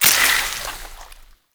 Void Hit Effect; Warframe

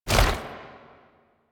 Tank Infested Arm Move Fast; Lasrian Tank; Movement

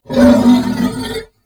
Horse Vocal Screech Stopping; Warframe; Duviri Horse; Vehicles

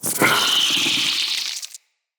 root spider vocal attack; Animals; Spiders; Vocals

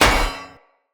Sphere Board Impact Default, Shields; Weapon Impacts; Sphere Sword And Board; Environment

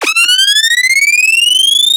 Shock Trap Projectile; Warframe; Missions; Arena; Grineer Champions; Charger

